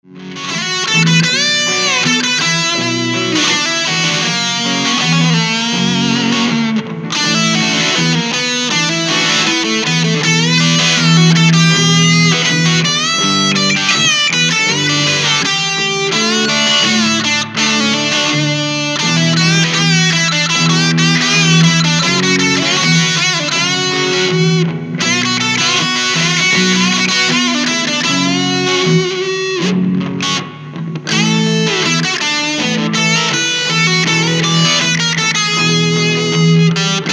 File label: solo ac10, Vox AC10(C1) Amp